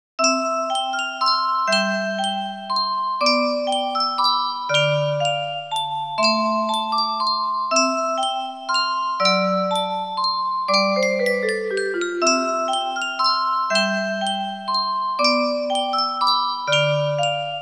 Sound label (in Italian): carillon